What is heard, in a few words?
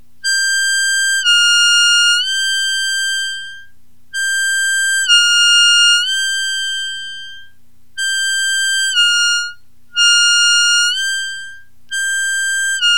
bending, harmonica